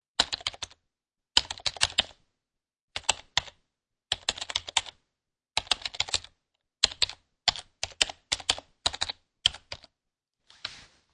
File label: Computer Numeric Keypad Typing, Various lengths and bursts of ty; typing on a PC keyboards numeric key pad; Lynne Music